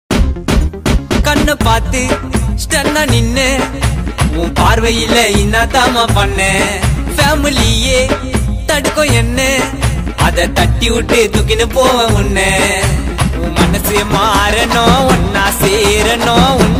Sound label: gana tamil dj kuthu